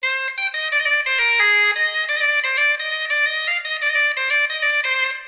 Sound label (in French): son audio debut de chanson